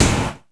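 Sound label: Yamaha RX5 FM snare, Drums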